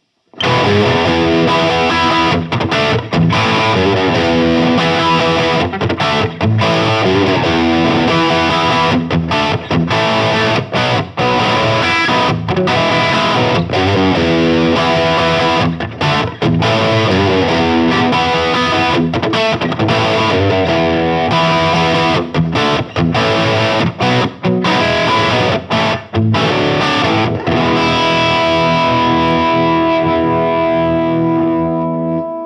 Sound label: Engl Savage 120 MkII Crunch